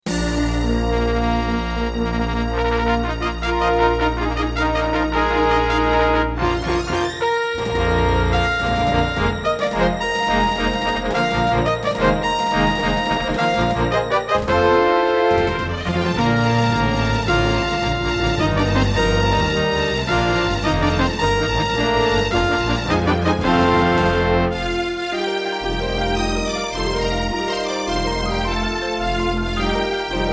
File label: Intro, Roland SCB-7; Dark Forces